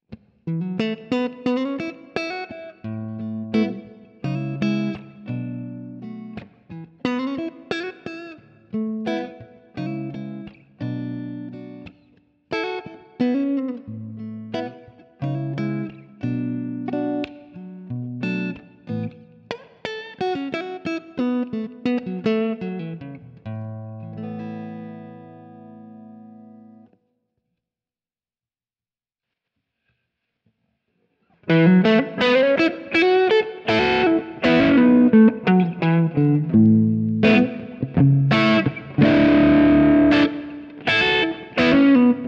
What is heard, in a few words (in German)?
eakustik front pu clean und dirty, elektroakustik telecaster